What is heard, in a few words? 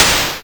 explosion, Retro Sounds; Kenney's Sound Pack; Retro Sounds 2